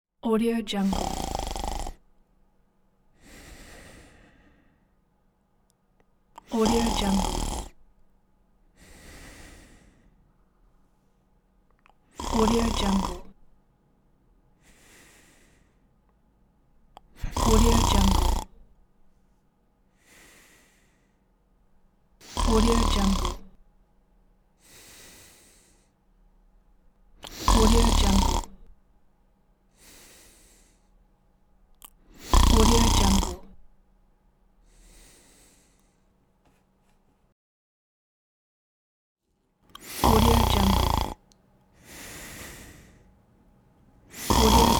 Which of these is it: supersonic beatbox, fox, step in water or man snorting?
man snorting